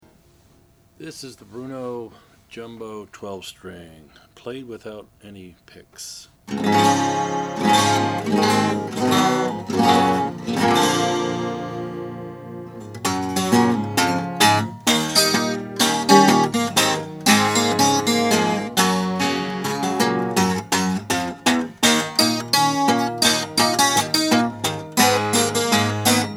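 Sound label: oscar schmidt bruno jumbo 12 natural, Guitars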